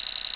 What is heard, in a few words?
crick